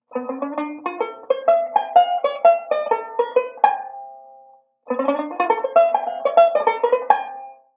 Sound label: ii V Pattern, banjo